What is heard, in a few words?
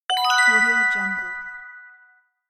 correct answer; soundseeds